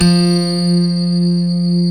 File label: GRAND PF F 2, AKAI S-Series; AKAI S-Series CD-ROM Sound Library VOL-7; D.GRAND PF